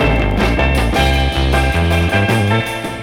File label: keys, phonky loops